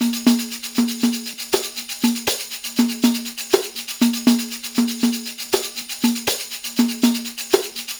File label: PERLP, ArabianTraditions